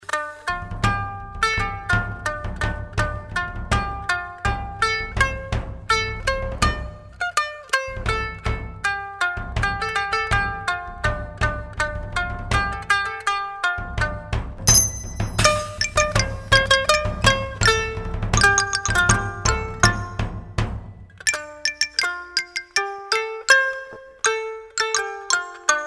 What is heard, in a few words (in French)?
Chine